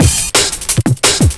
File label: Bad Break, Loops; m8 breaks